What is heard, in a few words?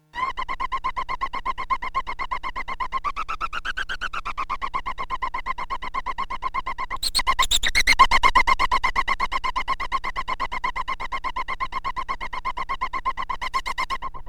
ring noise